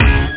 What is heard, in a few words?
guitar; cameo